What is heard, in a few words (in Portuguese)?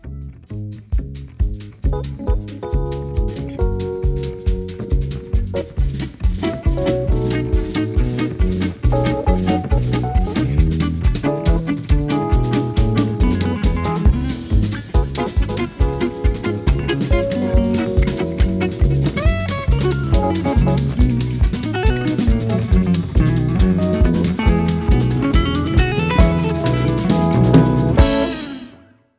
Fast Vinheta